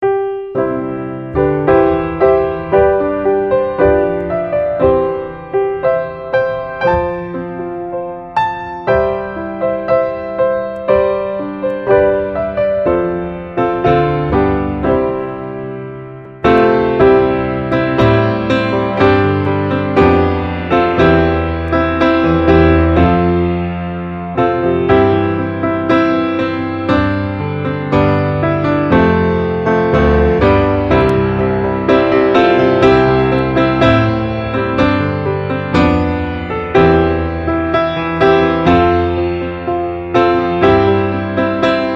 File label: Auld Lang Syne, without Jingle Bells